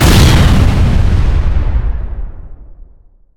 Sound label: time bomb explode; Original TK Sounds